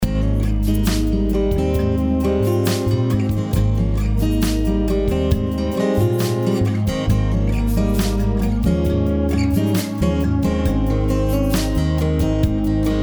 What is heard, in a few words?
newage